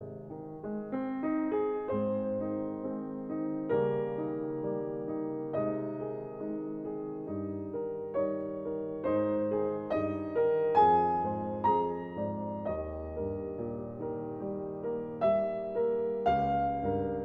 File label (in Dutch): Piano sonate